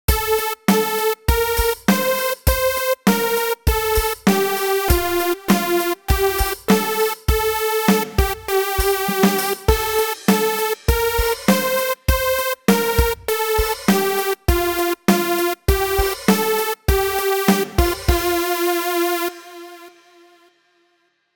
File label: drum; ode an die Freude